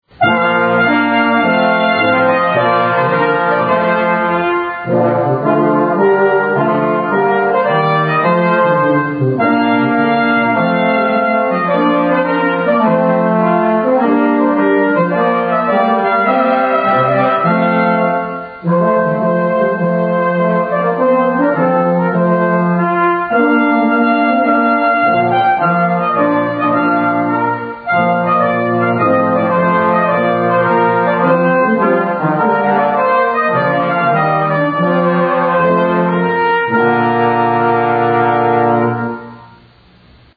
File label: trumpet tune; Trumpet Tune - Purcell